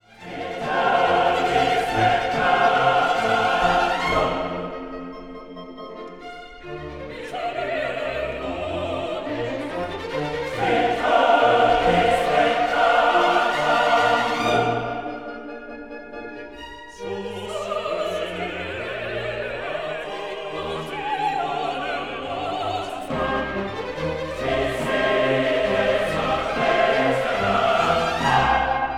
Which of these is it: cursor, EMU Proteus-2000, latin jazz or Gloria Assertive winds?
Gloria Assertive winds